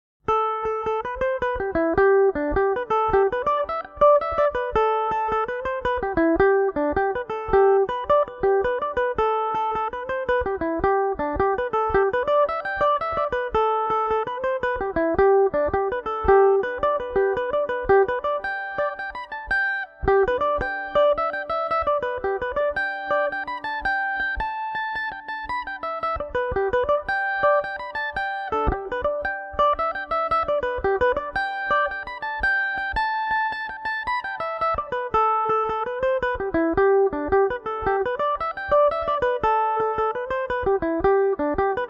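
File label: A dorian, irish mandolin; lark on the strand; belmuse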